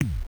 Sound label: bass